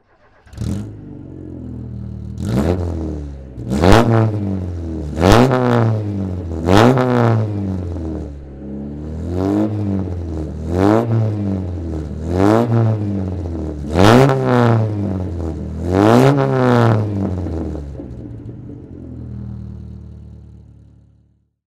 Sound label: morgan 44 ford sportexh throttle; Morgan 4/4 1800, 1997; Engine sound; V3D/ ClassicarImages; Marc Vorgers